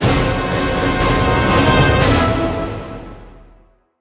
large Win Autoplay; victorious

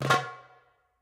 quick Stop Drum; wild bazaar

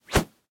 winbannershow; blackjackclassic

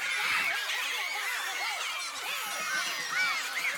party; vegas night life

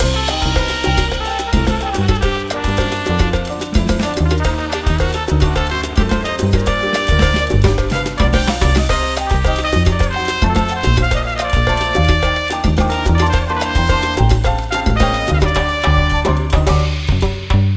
big Win Loop; narcos

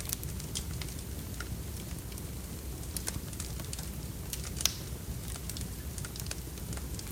outro free spin loop; jingle spin

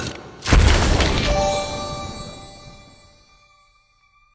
bonus Game Symbol Pick; secret of the stones

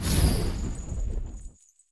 linked Reels Stop Flash; conan

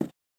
button Down; goldengrimoire

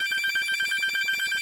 big Win Loop; magic maid cafe

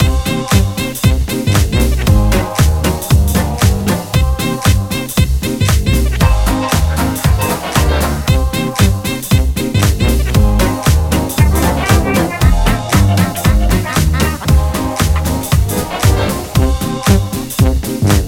cash stack music; pork knox

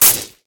Stone Flashes; gorillakingdom

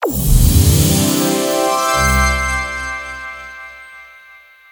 midi jackpot background; pork knox

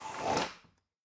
reel Shift; robin hood

